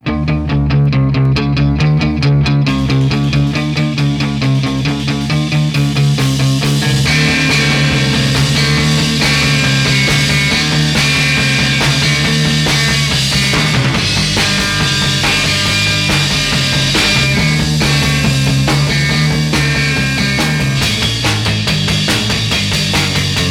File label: Instrumental